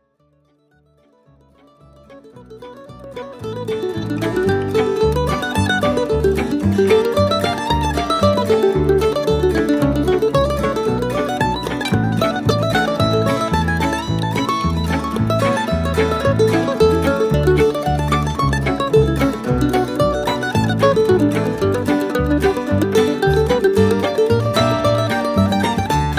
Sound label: Mandolin playing